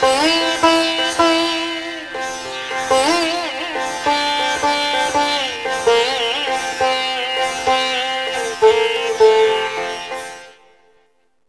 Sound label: sitar